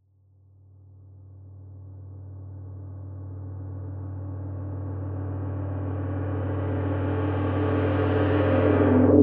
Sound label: Gong; Gong Rev